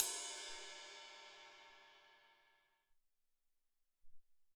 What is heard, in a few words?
Ride, Alesis SR-18